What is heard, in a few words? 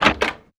Hinged Door Open